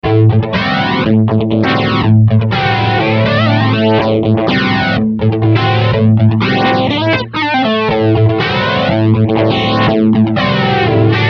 flanger; Mobius

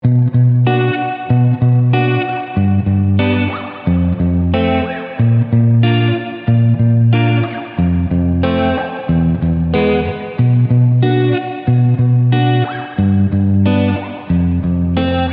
magneto; bigsky

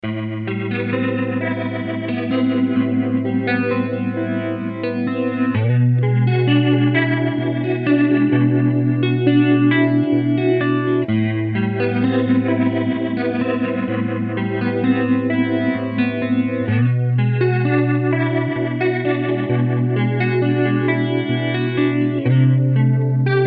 rotary; mobius

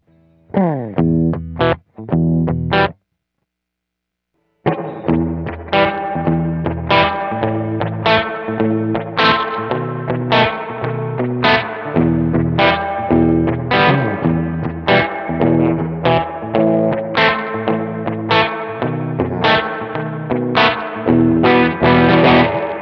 spring; BigSky; reverb; Spring Splash

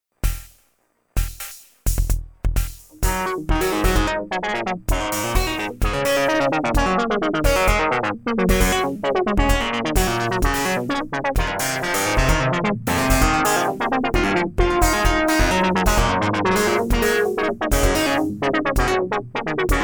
Karplus Strong envelope filter EQ

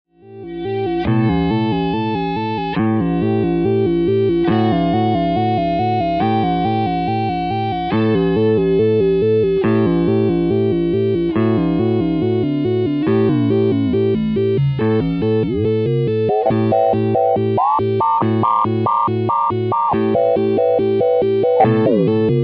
quadrature; Mobius

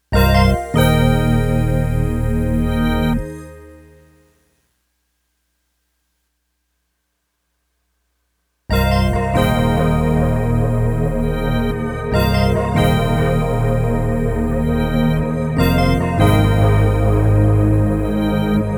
dtape bokeh bells

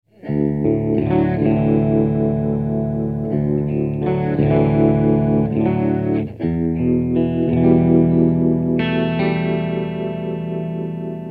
Mellow Horns; Strymon Iridium → Strymon Lex

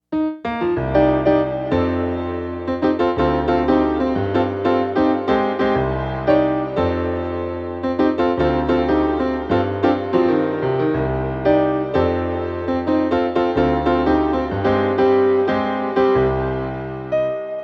plate, piano; bigsky; ableton piano

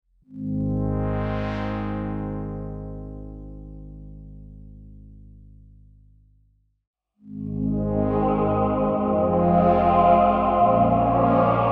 chorale, yamaha dx21

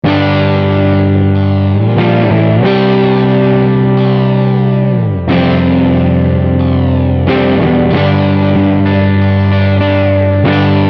quadrature, mobius

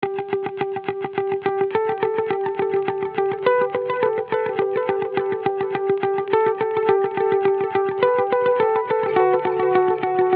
guitar intro staccato

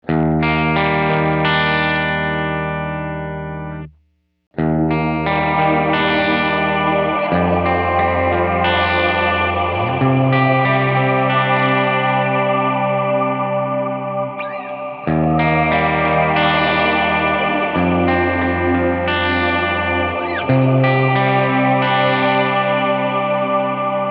chorale; bigsky; reverb; Voce Plente

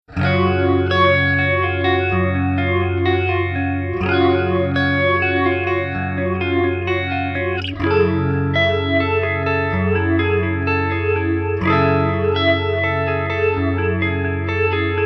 phaser; mobius

